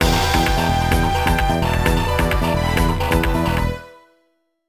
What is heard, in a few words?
BGM STAGE BATTLE; Phantasy Star Zero